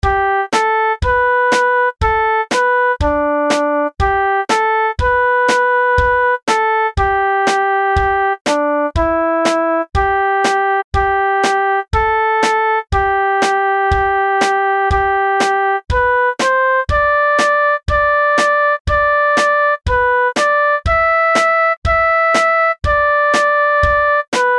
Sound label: March with slow rhythm